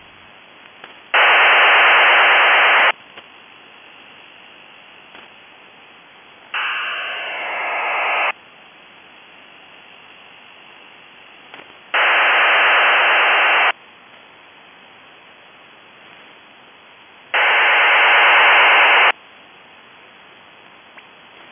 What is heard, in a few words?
2400Bd QPSK Burst